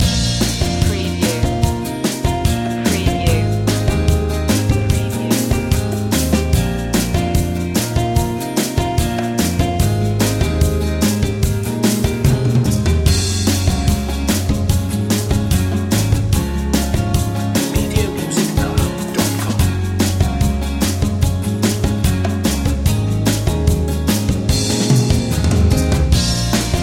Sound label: clear blue loop